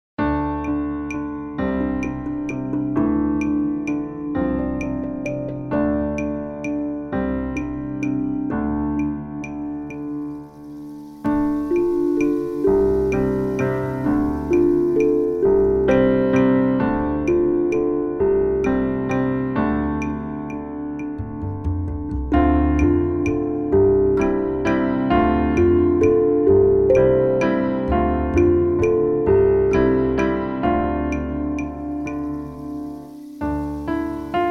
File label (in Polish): morskie dzwieki, instr